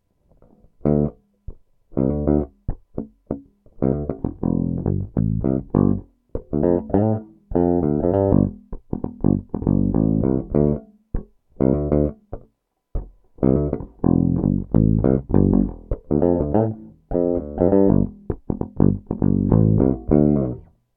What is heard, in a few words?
Bridge Pick Tone DOWN, peavey; Peavey based pickup Shootout